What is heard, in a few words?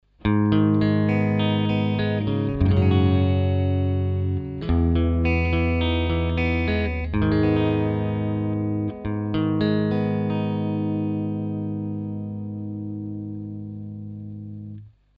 Clean No Effect